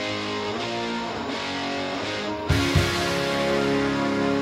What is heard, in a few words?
guitar intro